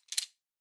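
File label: Soroban Noise, abacus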